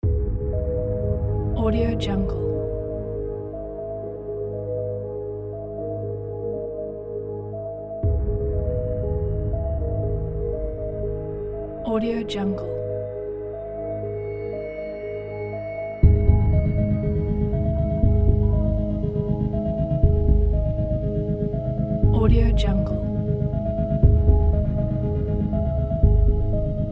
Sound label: tension suspense music